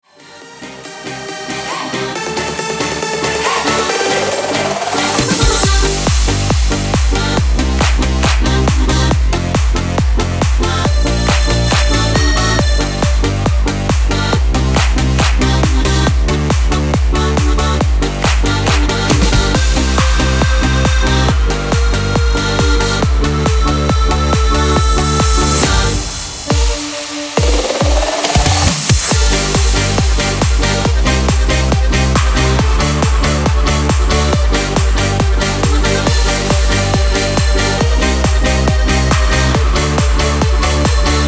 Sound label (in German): Karaoke ohne Chor